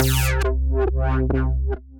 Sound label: Inhale Effect; Bass